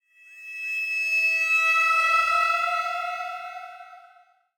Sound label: Mystic Eerie